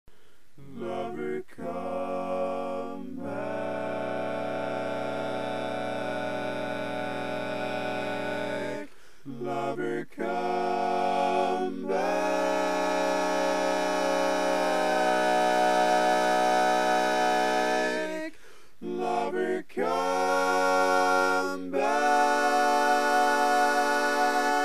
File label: Tenor, barbershop tags